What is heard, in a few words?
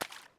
Foot Wet Puddle Run